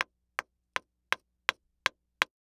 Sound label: Sports Hockey Stick Hit Ground